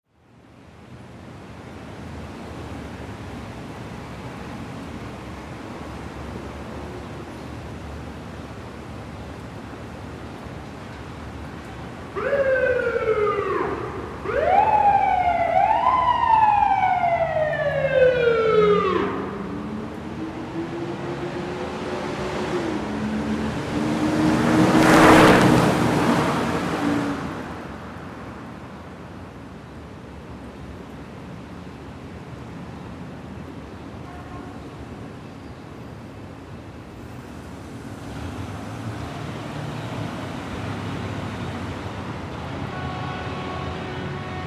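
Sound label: City Ambience (Georgetown, D.c.): Traffic, Police Car By Fast With Siren Close Up, Honking Distant, Screeching Car Medium Distant.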